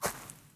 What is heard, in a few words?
Footstep